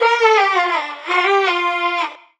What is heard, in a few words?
vocal hue Gm; SD AURORA Sample Pack; Slate